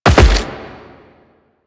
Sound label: Land Rock, footsteps; Warframe; Quests; The New War; Kahl